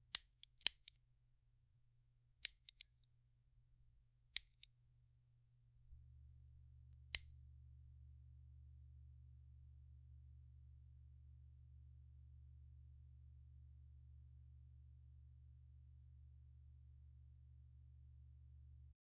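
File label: a highlighter highlighting; stationary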